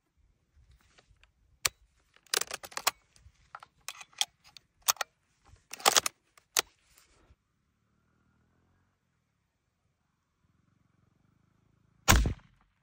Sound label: ak 47; اسلحه